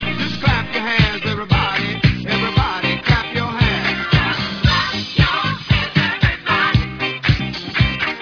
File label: rap